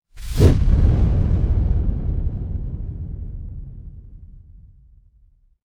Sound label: fire electric whoosh flame